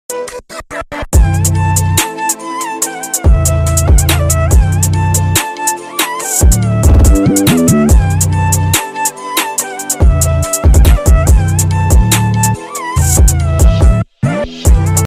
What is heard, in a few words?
indian drill beat instrumental